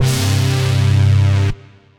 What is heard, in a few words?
Buzz Glide, Bass